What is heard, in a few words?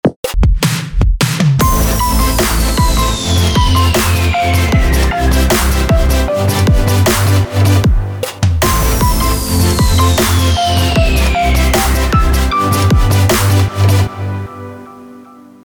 futurebass classic